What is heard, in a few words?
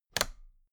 toolbox latches close regular double; industrie wirtschaft; handwerk